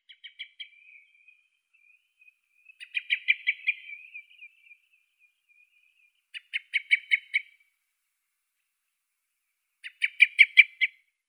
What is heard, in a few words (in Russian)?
zvuki prirodi drozd burii turdus eunomus